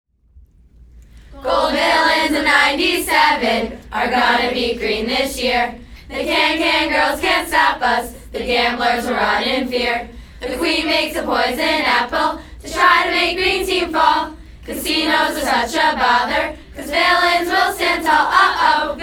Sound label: cheers